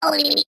cartoon voice high pitched head shake or lip wobble